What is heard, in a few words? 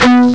pick; ST-XX Amiga Soundtracker Sample Packs